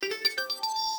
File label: cosmic notification